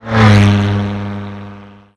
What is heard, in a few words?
Car Passby Fast